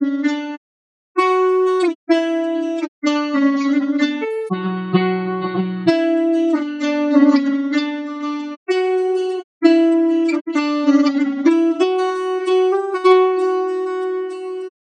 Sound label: solo